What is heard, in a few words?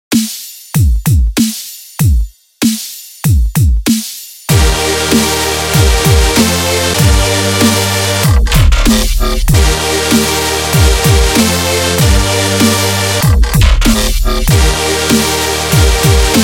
drum and bass, ringtone